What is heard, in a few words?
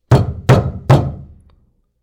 Door Pounding